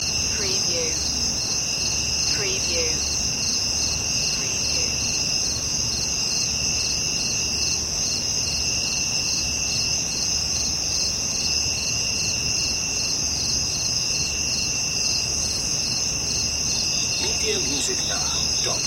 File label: Night Insects